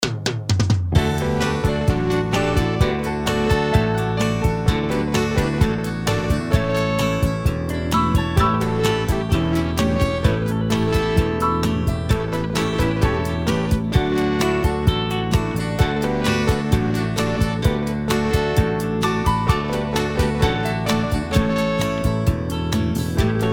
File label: christmas kit instrumental beds